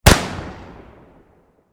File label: Warfare Pistol 44 Magnum Single Shot Exterior; Pistol Sound Effects; Pistols (Sound Effects:Guns); SFX Bible 6